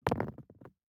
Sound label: DICE on Neoprene, Throw and Roll, Metal, 2 Two Dice; Samples; ArticulatedSounds; DICE Sfx Party (Extended)